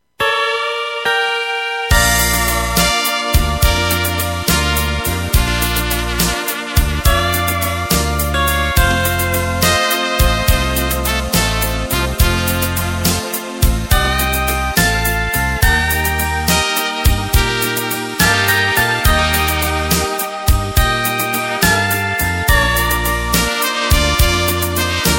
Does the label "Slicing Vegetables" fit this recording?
No